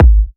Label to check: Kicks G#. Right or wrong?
Right